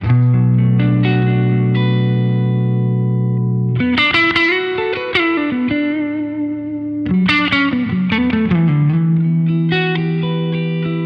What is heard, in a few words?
Clean